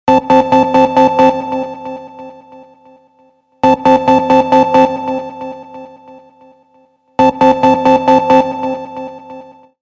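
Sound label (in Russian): звоночек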